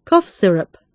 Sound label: Cough